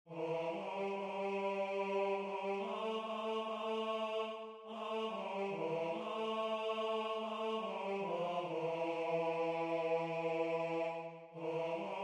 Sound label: Tenor